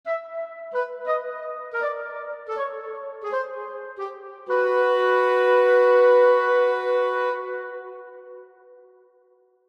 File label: Woodwinds Melody Descend Playful; Musical; Blastwave